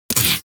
Shotgun Alt Mech; Weapons; Warframe; Tenno; Revenant Shotgun

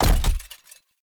Step; Warframe; Grendel; Grendel Deluxe

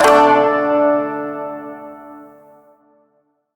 Shawzin Chromatic Chord C; Chords; Warframe

